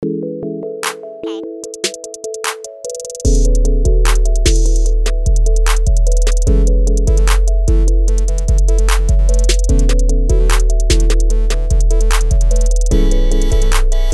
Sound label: beat